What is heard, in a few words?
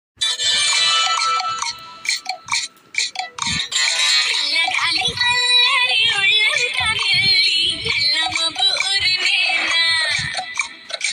song; athadu